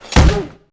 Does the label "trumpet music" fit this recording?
No